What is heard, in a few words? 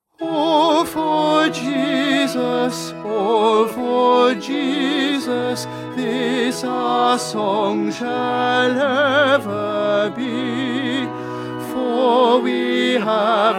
Tenor; Stainer; Crucifixion